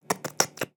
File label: Kitty Cat Licking; creatures organic; Backpack